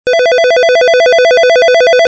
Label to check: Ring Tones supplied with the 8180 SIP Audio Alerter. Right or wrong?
Right